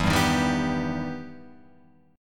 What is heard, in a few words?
E Minor Major 7th, Guitar, Open F; EmM7 strummed; 4,2,3,2,2,2